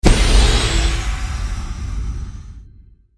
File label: spell explode; archer